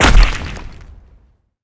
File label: skill explo